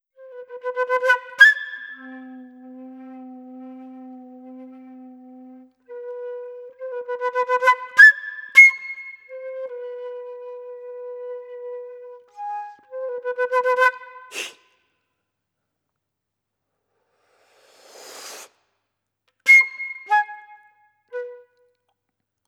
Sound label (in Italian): Flauto